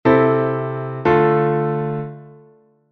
mal; armadura 1 bemol, dous acordes: do-do-mi-la; re-la-fa-la

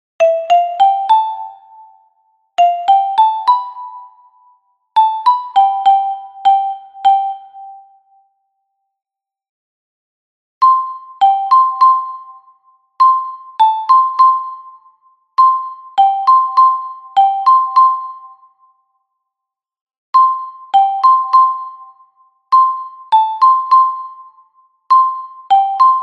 Xilofono Soprano; Entroido

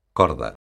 corda

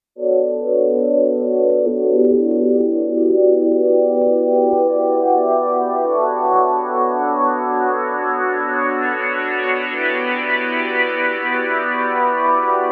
sintetizador

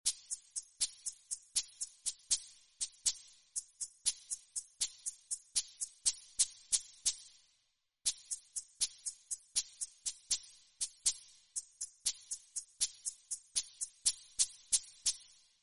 Maracas; Siyahamba

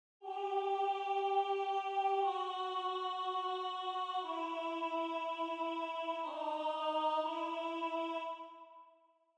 La Tarara retrouso 4 voces voz 3

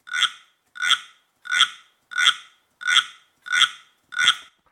GUIRO; Instrumento musical de batería

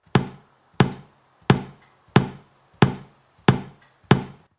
BOMBO; Instrumento musical de batería

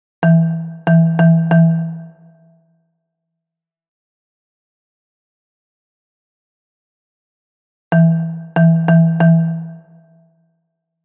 Xilofono Baixo; Pombiña da paz; Unha peza para cada festa

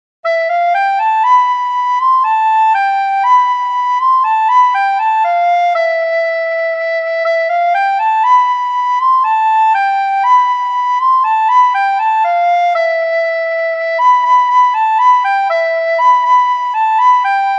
A Saia da Carolina, frauta doce

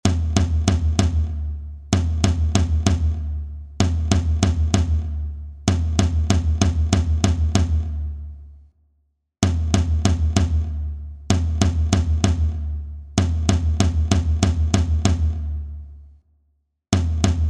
Non chas quero, pandeiro

